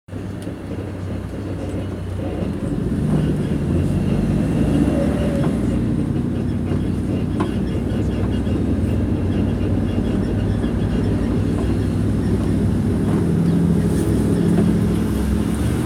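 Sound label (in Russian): звук маршрутки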